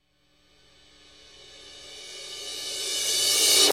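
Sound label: Stinger Reverse Cymbal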